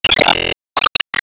Enemy Roadmechanic Throw Ball; Disney Star Smash; Android, iOS, Mobile

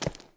Rock; lethal company

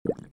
Tears Fire; The Binding of Isaac

